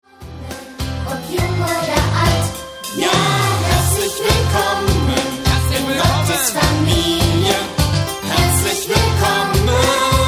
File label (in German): wir singen laut